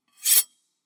rezkij zvuk lezvija; Звук ножа; Звук метания ножа

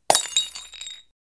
chashka ili banochka

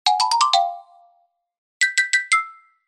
preduprezhdenie i rezultat ksilofon

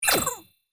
smeh malenkoy kosmicheskoy tvari

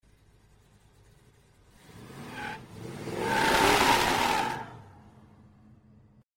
rezkij otezd s vizgom i na dalnem plane vizg tormozov

